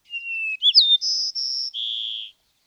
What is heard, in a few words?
White-crowned Sparrow